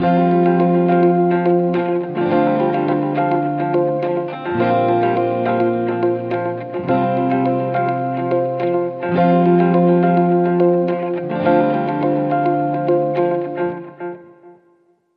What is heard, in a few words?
Bright Confident Beginning, No Drums